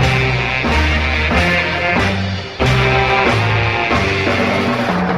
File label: horns and sax, phonky loops